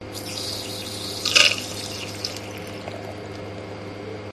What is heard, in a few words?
pub keg pour drink hum background; field reports